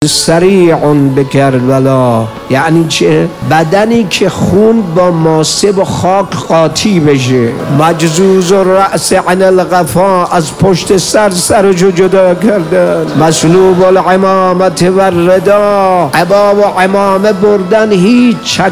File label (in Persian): روضه